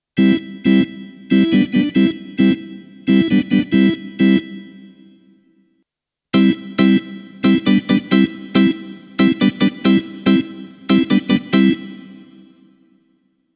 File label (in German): Ohne und mit Perkussion